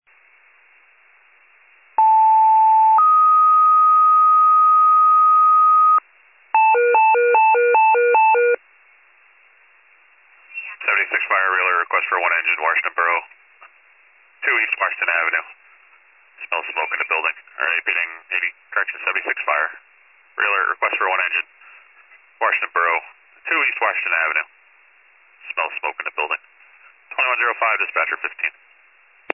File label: fire, pager alert